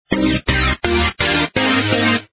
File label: Ringtone; BQ Russia BQM 1402 Lyon